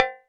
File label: Cowbell